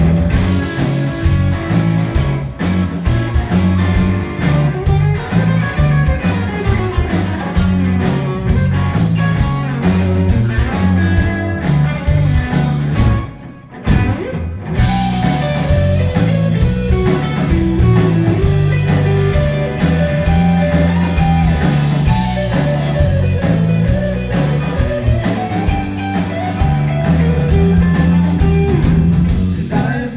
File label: solo; lead guitarist